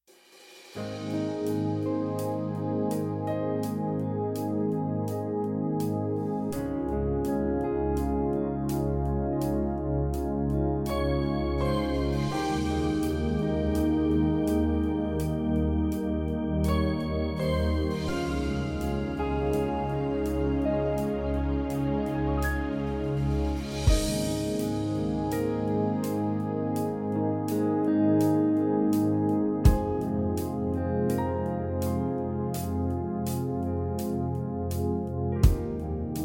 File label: Atmosphere Shift